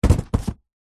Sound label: cardboard box parcel heavy set down wood deck external; zapsplat